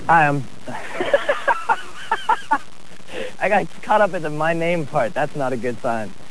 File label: laughing